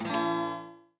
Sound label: swing mariachi; Golf Blitz 1.12